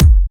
Electro Kicks

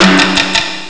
drum; Amiga Soundtracker Sample Packs

